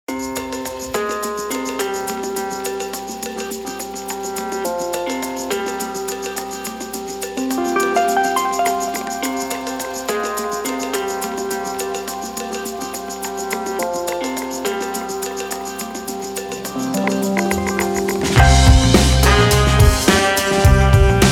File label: blues original; Prodipe Pro 5 V3 — активный студийный монитор с фазоинвертором